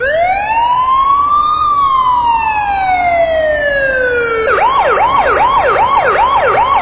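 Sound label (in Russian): звук сирены преследующей полицейской машины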